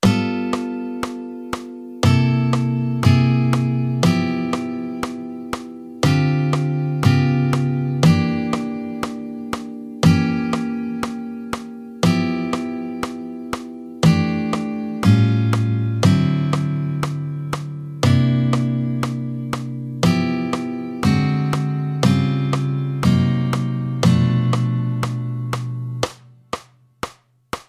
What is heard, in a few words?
Shell Voicing, jazz guitar